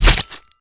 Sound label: Spear Scale, Damage